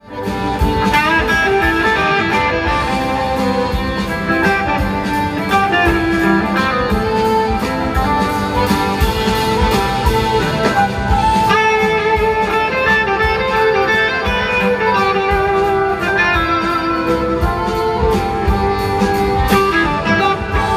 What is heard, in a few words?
Pensa; Cologne Lanxess Arena 2015